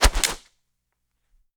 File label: designed whoosh whooshes arrow feather snap; transitions and movement